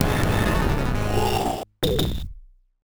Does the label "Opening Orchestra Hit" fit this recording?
Yes